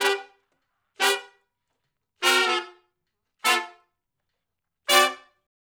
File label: Shuffle Blues Riff [V, IV, I] (D) har, Horncraft for R&B; Sections; Crimson Blue and Fabulous Horncraft 4 RnB